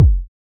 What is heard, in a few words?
Kick; Samplepack Produtique